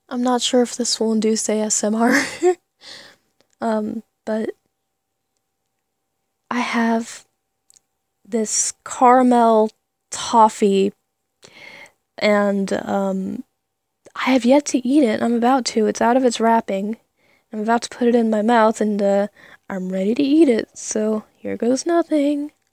Eating Caramel Toffee, salivating, saliva noises, mouth sounds, chewing; potential ASMR